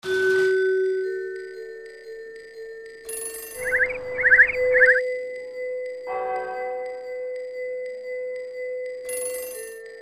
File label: spring